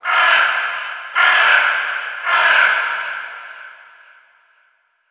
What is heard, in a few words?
environment crow